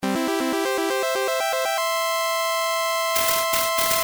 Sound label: trumpets; End Level Trumpet Sound; A game demo where the player changes the terrain by laying down blocks; Game Music by Marc Nimoy